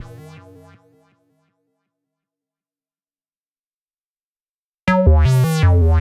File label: Acid Fx; Downbeat